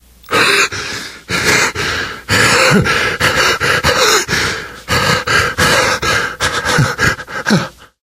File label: exertion critical, survivor voice